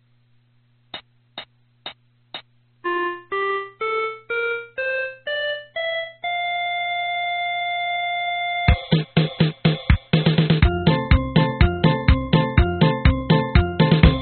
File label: acc only